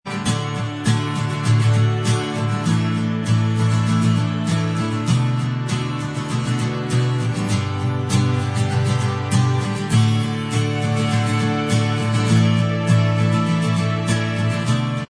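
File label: acoustic, instrumental, backing track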